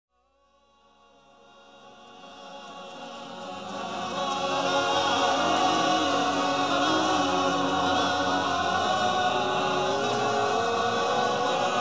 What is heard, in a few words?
Tuning; Christian Music